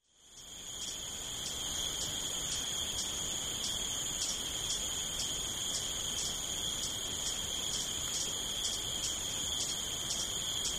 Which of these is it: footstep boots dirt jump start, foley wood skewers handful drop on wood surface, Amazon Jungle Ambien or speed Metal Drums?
Amazon Jungle Ambien